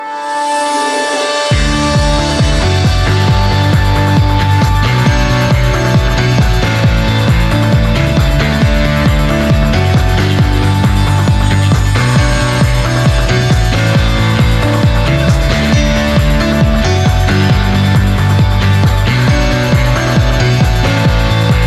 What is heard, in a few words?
Pretty Pretty Girl Instrumental